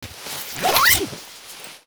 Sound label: zipper fast; soundscrate